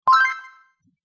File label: secret found